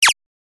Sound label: lazer